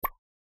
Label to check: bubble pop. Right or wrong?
Right